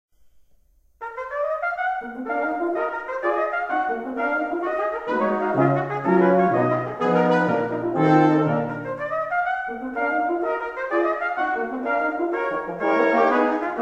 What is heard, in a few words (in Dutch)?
Quintet Variatie; Ewald; Quintet 2 opus 6